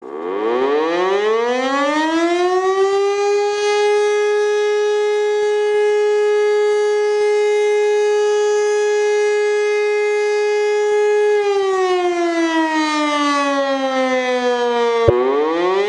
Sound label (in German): Sirenenalarm